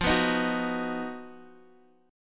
B Major F

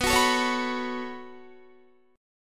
B 7th Suspended 2nd, Guitar; B7sus2; strummed

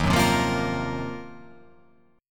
D sharp Minor 6th Add 9th D sharp, chord

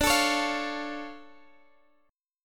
D Minor Major 7th D, guitar; strummed

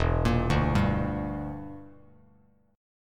E Minor 7th Flat 5th E down Bass Standard; arpeggiated

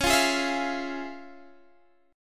F Minor Major 7th Sharp 5th Db, guitar chord

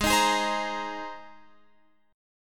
G sharp Major Add 9th, Guitar Open F; G#add9 Chord

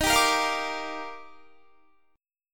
Eb Major 9th, Guitar; EbM9 strummed; 22,22,22,22,22,22; JGuitar

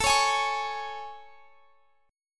A Major 7th; AM7 strummed

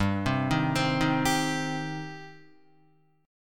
G Suspended 4th Sharp 5th G down Guitar; Gsus4#5; arpeggiated; JGuitar

